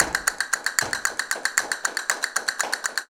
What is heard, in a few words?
CASTANETS, Flamenco